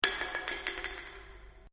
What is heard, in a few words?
Can Aluminium Inside Drop; various metal cans; Drops (Sound Effects:Objects)